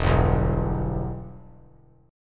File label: E-Minor; strummed; JGuitar